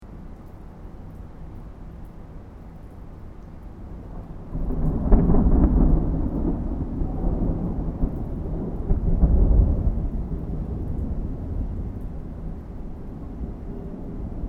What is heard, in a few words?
Thunderstorm Rain Big Thunder; Elemental weather effects